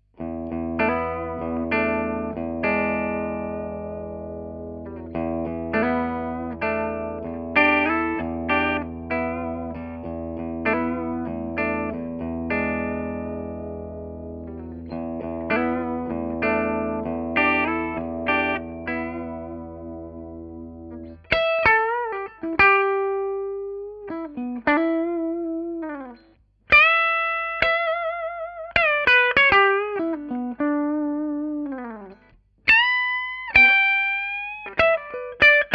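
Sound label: clean bridge, guitar pickups; schwarzbach; HB59-CreamGen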